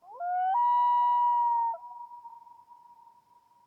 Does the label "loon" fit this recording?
Yes